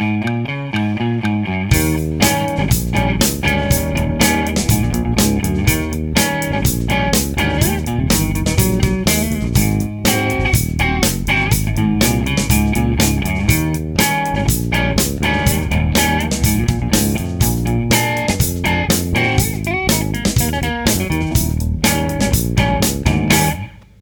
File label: Mary Had A Little Lamb, Scream